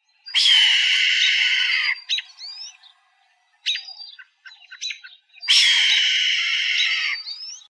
Hawk Red-tailed

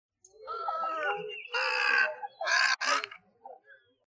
Heron Great Blue

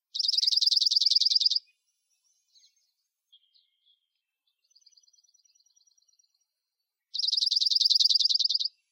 Junco Dark-Eyed

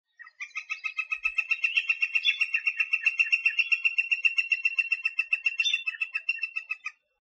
Flicker Northern

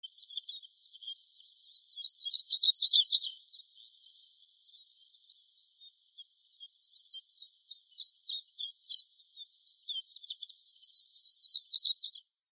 pipit Sprague

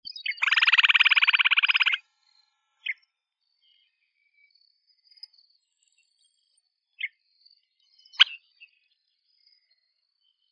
Sandpiper Upland

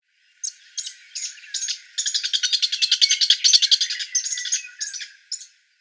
Woodpecker Lewis

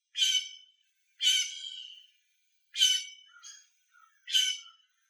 Jay Blue

